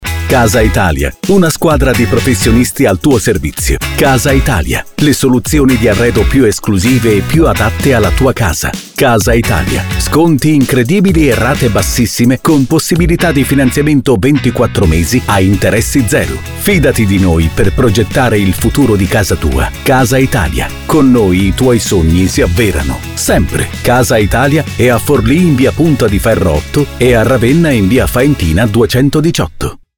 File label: jingle